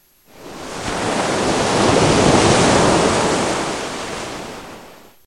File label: ericsson short ring; ringtones